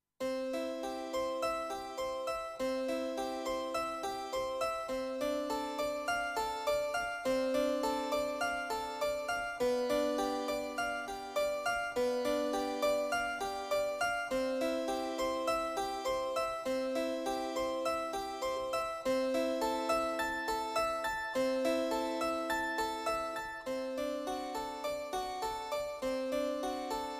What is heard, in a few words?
Harpsichord